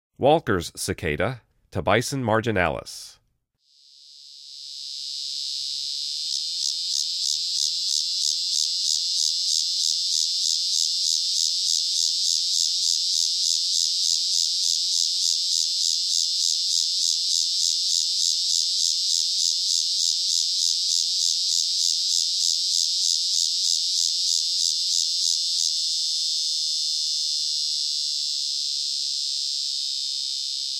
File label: Walker's Cicada, Insects; The Songs of Insects